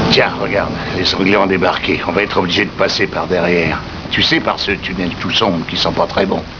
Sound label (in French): classe tunnel